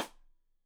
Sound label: Side Stick Stereo; Deeply Sampled Drums